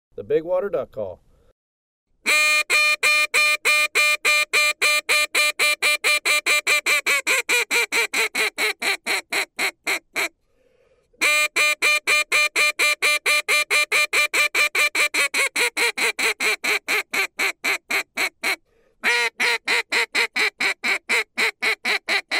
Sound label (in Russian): zvuchanie big water duck call